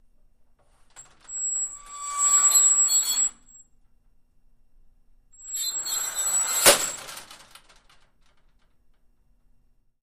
Door Accordion Sliding Metal; Double; Open And Close 3x, Very Squeaky, Slow, Medium And Fast; Metal Gate